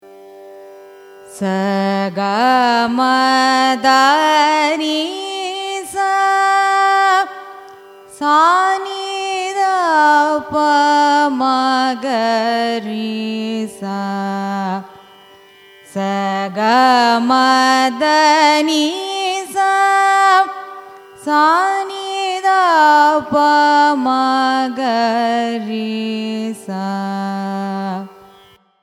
raga kalyana vasantham arohanam avarohanam, carnatic music